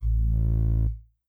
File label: barkskin on